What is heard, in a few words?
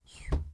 Thud